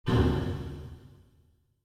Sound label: Deep Metal Impact